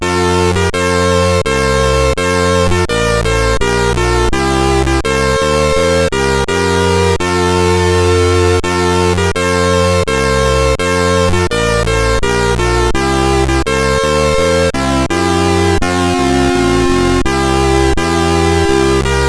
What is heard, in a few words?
Polish Anthem, CSG8580R5; Wijnhoven Joachim